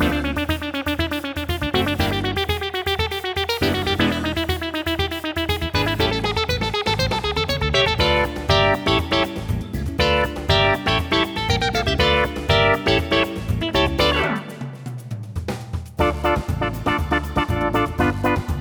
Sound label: Pinball Gremlins, funny